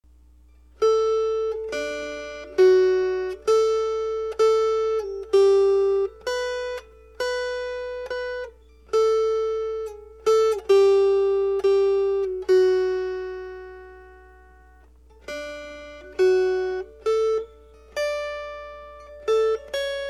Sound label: Santy Melody